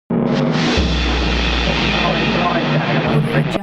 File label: science fiction space earth miserable radiofrequency connecti